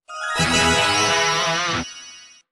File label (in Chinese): 胜利